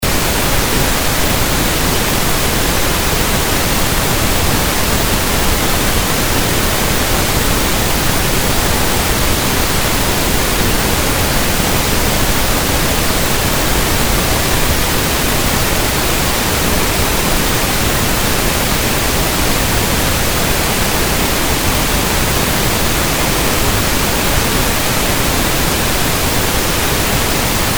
7. Pink noise—1 dB peak 1/3 octave wide, at 2500 Hz.; equalize in 1/3 octave bands